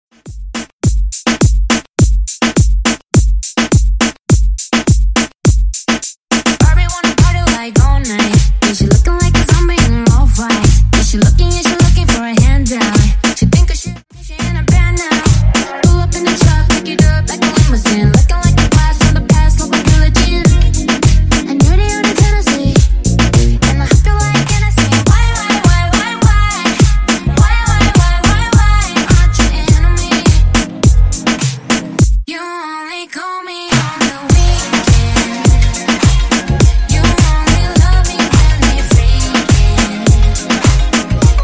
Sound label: Moombahton Re Drum 104bpm